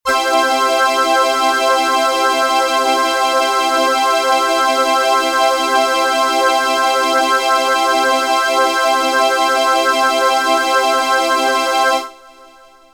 lambda master phaser

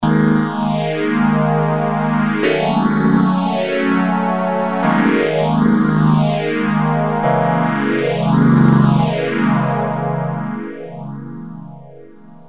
phasor; dod

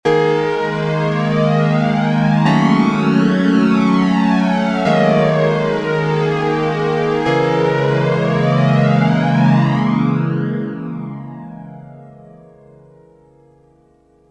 polyflange

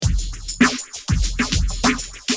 drums intelliphase

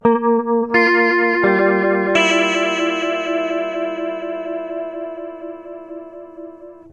clean gtr destination rotation

